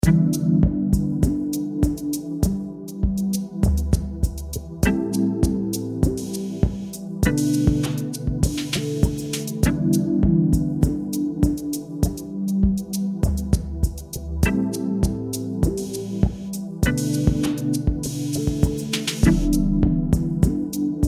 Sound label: E100 Kinetic 808 Groove